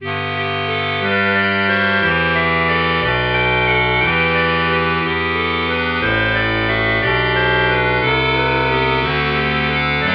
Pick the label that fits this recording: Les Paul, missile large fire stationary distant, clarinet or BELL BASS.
clarinet